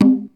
Conga High, Ensoniq ASR-X Pro

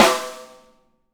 SONOR, SONOR SNARE

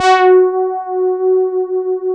DS8 F#4, Korg; Back In Time Records; Korg Universe VOL-1

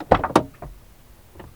LOADING, Transportation Doors Hoods Brakes

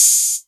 808 Hat O; Novation Drumstation

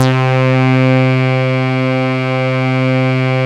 CS-15, BASSES; Masterbits - Vintage Voltage; VV BASSES

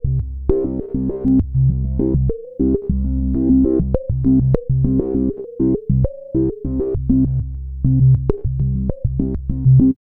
S+H REZO, SYNTH; AMG; Global Trance Mission VOL-2

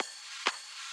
Loop, 128BPM; VEC5 Multi Loops

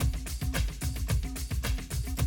drum loop, 100 BPM

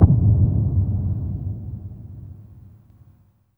THUNDER FUZZ, SPACEY DRUMS; Spectrasonic Distorted Reality 1

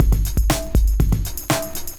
LOOP, 70's Breakbeats; USB Soundscan vol.46; AKAI

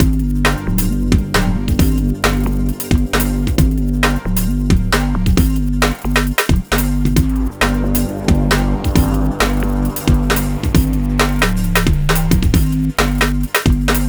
Hardcore Breakbeat, 134 Gmin; Big Fish Audio; Hardcore Breakbeat Action; Rezanator

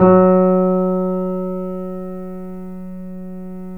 F#, The Piano; InVision Interactive - The Piano; BOESINGSOFT

